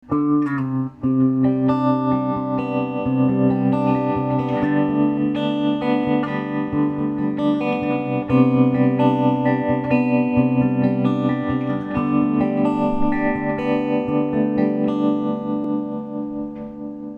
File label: trem; effectrode